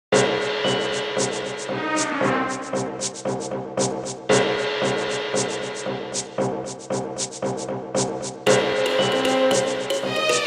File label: Opening Fortress; Pirates of the Caribbean: Dead Man's Chest